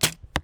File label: Bow shoot